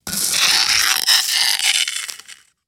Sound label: dry ice sparks